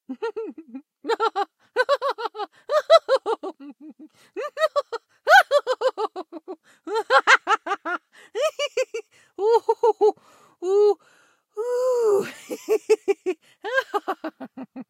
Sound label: laughter